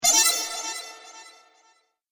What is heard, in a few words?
Dramatic Laser Punctuation, Sci-Fi Laser Sound